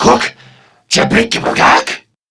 Surprised Crewmen

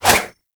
Prime Dagger Whoosh; Dual Daggers

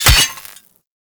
Melee Axe Impact Robotic Flesh; Warframe; Axes

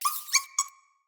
Misc Squeaks, Animals, Vocals; Floppet

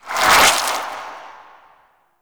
Shield Charge; Warframe; Garuda

